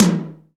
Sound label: TOM2, Roland R-8